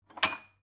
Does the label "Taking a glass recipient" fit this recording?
Yes